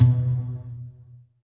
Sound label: SYN PIZZ, JD 800